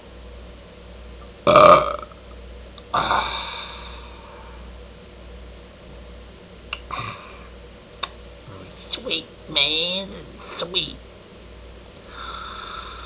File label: sweet, burps